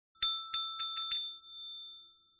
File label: Hotel desk bell ringing soft gentle